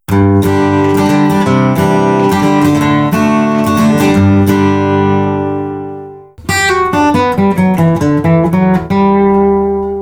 Nylon Picks nejlon

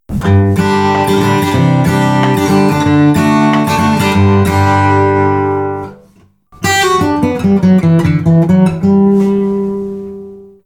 Wegen Picks Vejgen analog kompozita

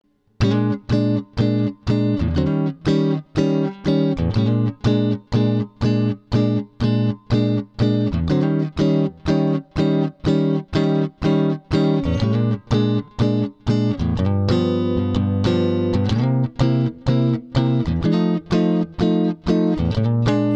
blues jazz

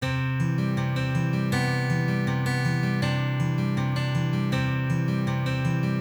Let Ring

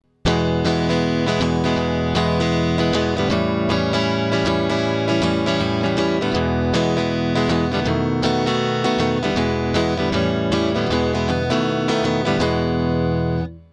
blues prog

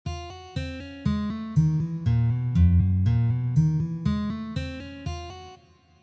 Hammer On

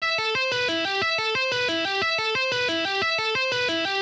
Tepping Tapping

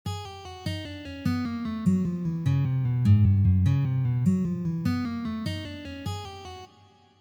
Pull Off

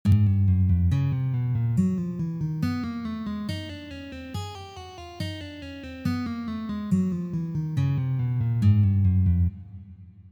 Pull Off

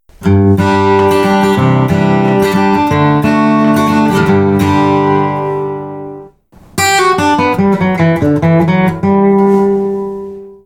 Picks Acrylic Akril; Gravity

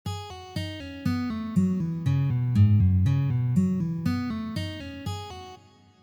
Pull Off

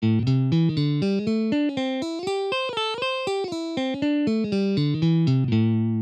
Slajd Slide

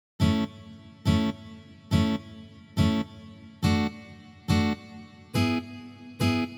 Ska stroke

